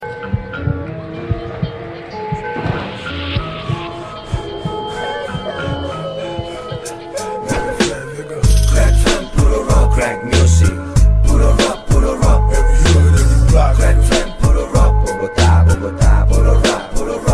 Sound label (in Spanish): Puro Rap